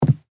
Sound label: High heels single step on carpet